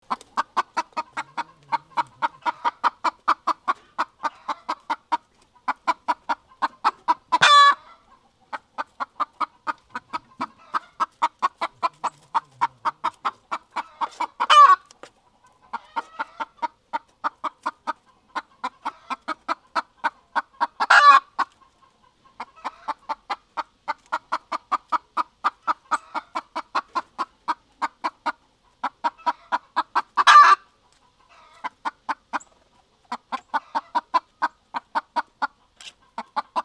ghod ghod rooster; صدای خروس

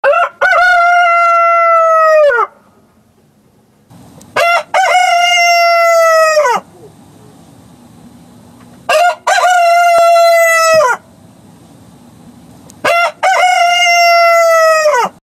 rooster sound; صدای خروس

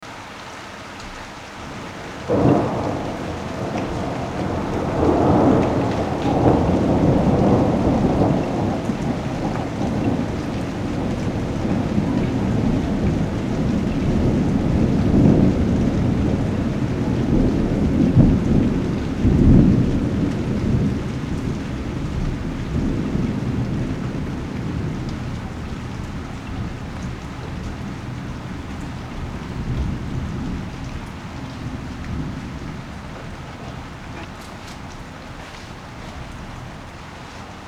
rain and lightning sound; صدای رعد و برق; صدای صاعقه; صدای رعد و برق، دانلود صدای صاعقه با کیفیت بالا

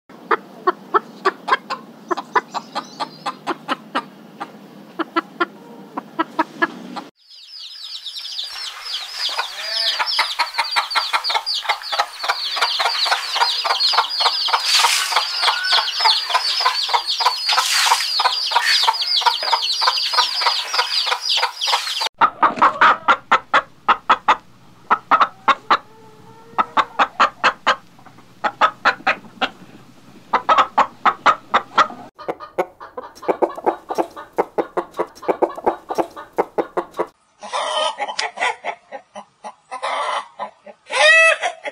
chicken sound; صدای مرغ

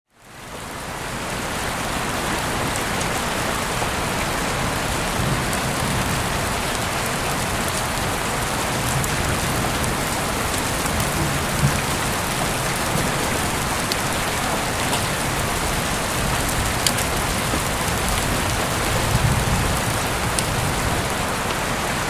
rain sound; صدای بارون: بهترین صداهای باران برای مدیتیشن

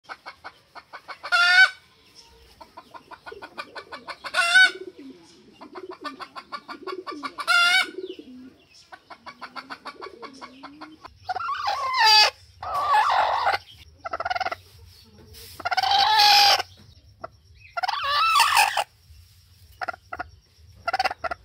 chicken sound; صدای مرغ

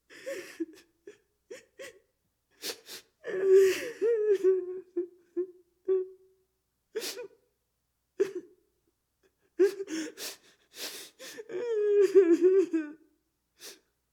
woman crying, گریه دختر جوان; صدای گریه

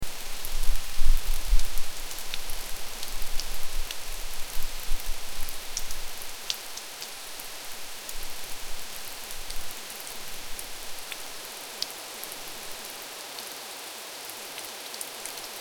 rain sound; صدای کوتاه; صدای بارون: بهترین صداهای باران برای مدیتیشن

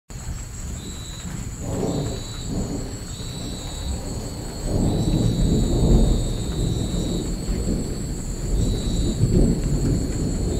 rain sound; باران در ارتفاعات; صدای بارون: بهترین صداهای باران برای مدیتیشن

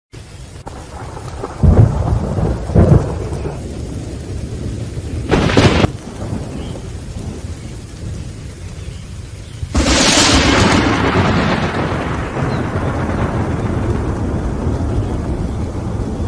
rain sound; صدای بارون شدید; صدای بارون: بهترین صداهای باران برای مدیتیشن

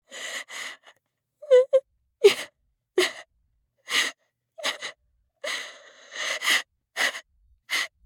woman crying, صدای گریه خانم

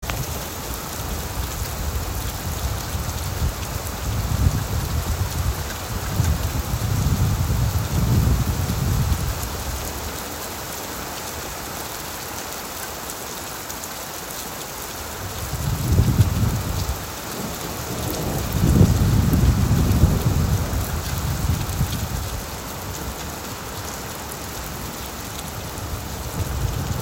rain and lightning sound; صدای رعد و برق; صدای صاعقه; tolani; صدای رعد و برق، دانلود صدای صاعقه با کیفیت بالا

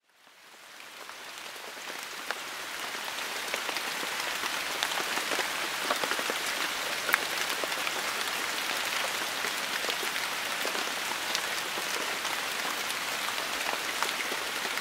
forest rain sound; صدای بارون: بهترین صداهای باران برای مدیتیشن

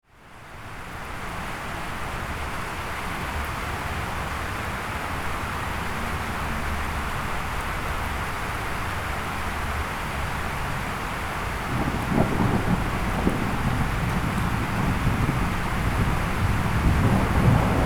rain and lightning sound; صدای رعد و برق; صدای صاعقه; صدای رعد و برق، دانلود صدای صاعقه با کیفیت بالا

